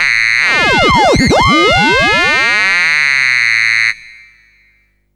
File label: FX, Drum & Bass